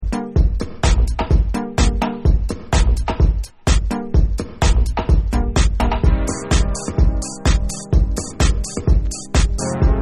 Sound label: Radio Instrumental